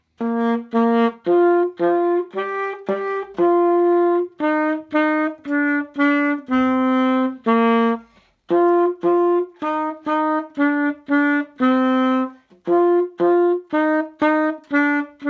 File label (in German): Morgen kommt der Weihnachtsmann, Sax